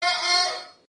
african mum ringtone

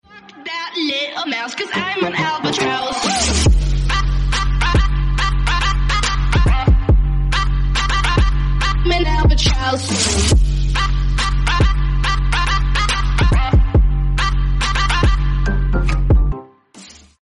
trap ringtone

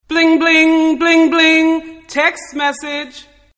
bling bling text message ringtone

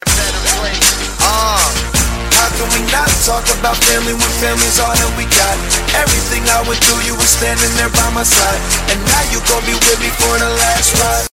rap ringtone; furious 7

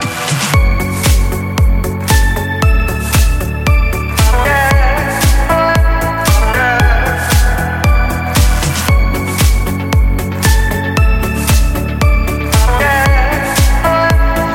norwegian routine tone ringtone

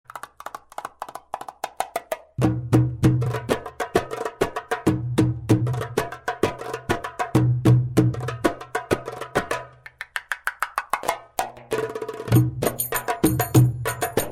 arabic darbuka ringtone

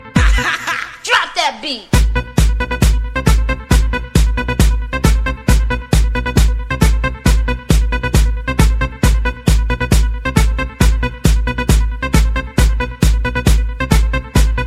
drop that beat ringtone

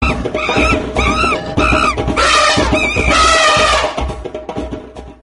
african safari ringtone

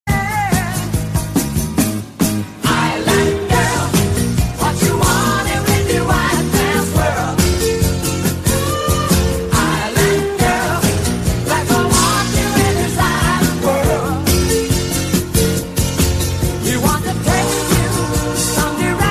samsung s8 ringtone

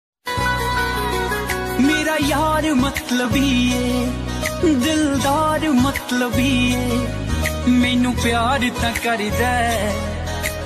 punjabi ringtone

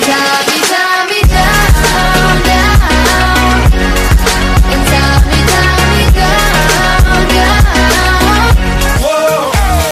ibiza electro ringtone

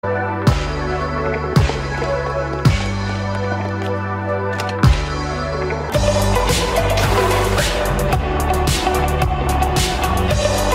moto g 3rd generation ringtone

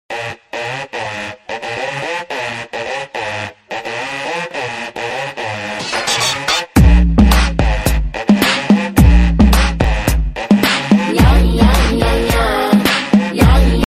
instrumental ringtone

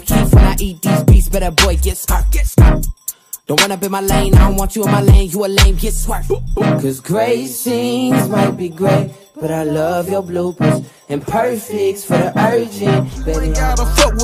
new hip hop ringtone

